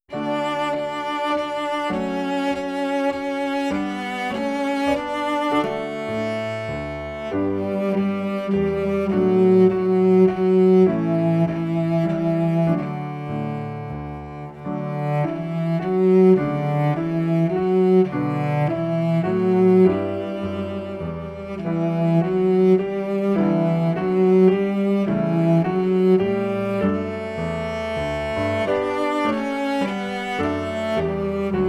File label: French Folk Song